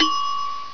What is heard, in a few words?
Bell Ding